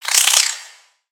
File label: Spider Alert; Lego; aronwk collection; ndaudio; creatures mechanical